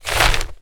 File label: paper sheets many crumpled compress movement; foley; zapsplat